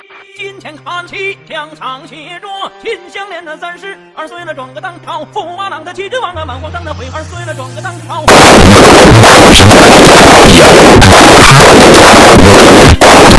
weird rap

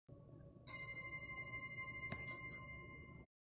up chime; schindler

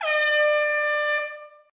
airhorn big and loud

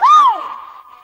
woo machine

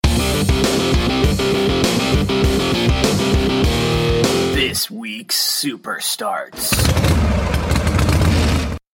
drop

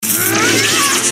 groan; ginormica

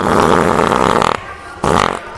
fart loud

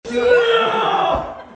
loose scream

